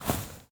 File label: footsteps player grass